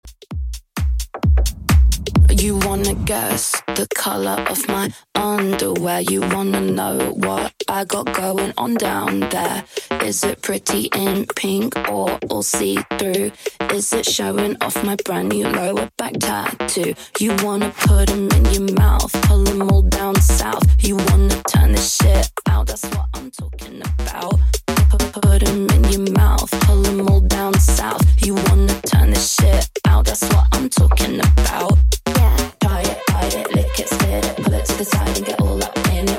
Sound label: Top40 Redrum 130bpm